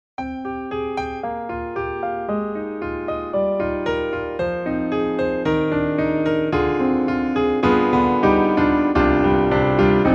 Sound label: natural grand piano; IK Multimedia; SampleTank3